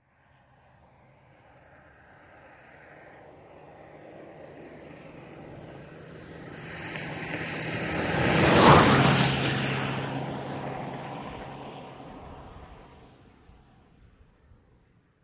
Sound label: VEHICLES ASTON MONTEGO: EXT: Fast pass.